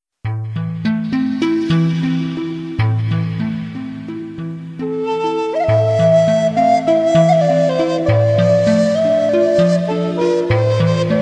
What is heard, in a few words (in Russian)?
muzika dlya relaksacii